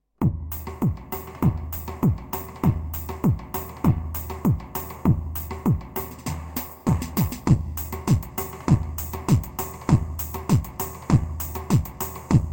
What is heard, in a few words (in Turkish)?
pa 500 tr halay, korg; tr karisik ritimler